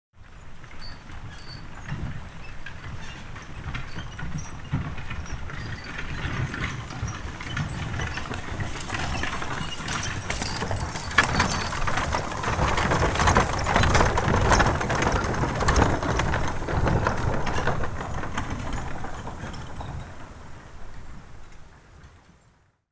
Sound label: Horse Drawn Wagon By; Electa Quinney